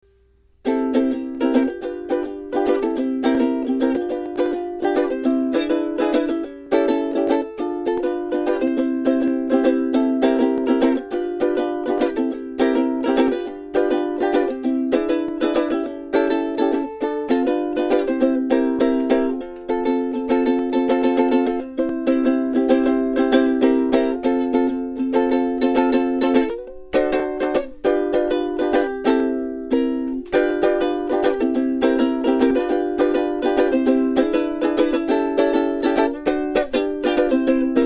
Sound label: Instrumental